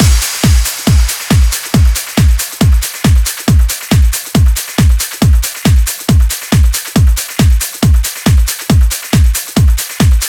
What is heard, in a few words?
Drum Mix 138BPM 16 bars; Drums & Perc; Vengeance Samples; Freefall Kit; E minor